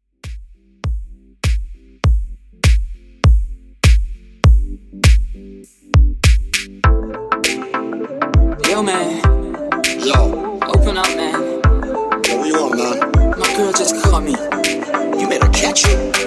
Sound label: Bootleg, 100Bpm